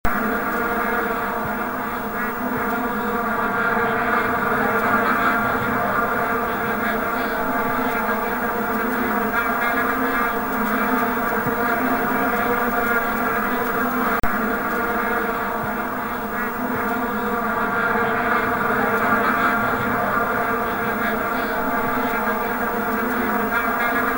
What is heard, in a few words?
Vuvuzela Sound